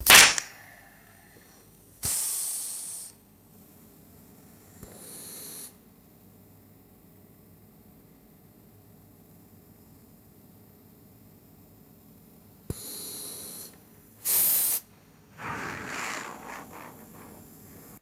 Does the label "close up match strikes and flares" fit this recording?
Yes